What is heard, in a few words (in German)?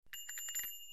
Fahrradklingel Brillant